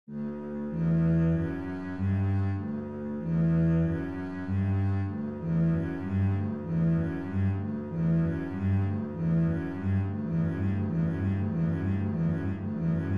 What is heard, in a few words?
bass etude